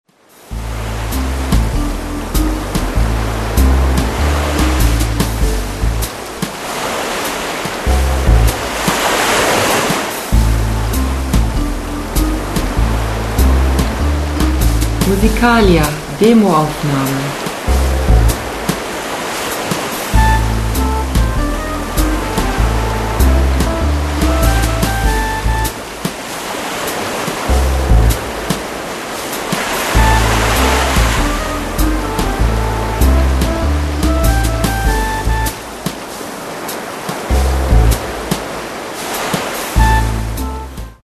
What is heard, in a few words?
tropical waters